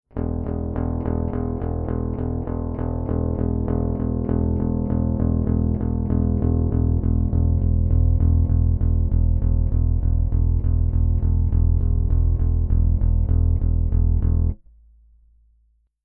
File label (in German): Bass Rickenbacker DI Signal Lo Mid Boost Stufenlos nach unten